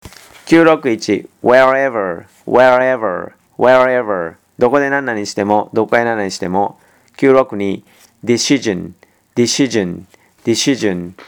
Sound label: tango